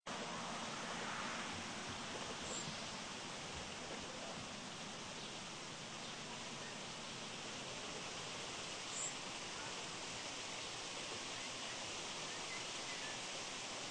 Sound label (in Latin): apus apus